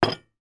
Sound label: household teapot place down on table